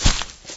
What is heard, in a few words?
Grass Light Medium, shagov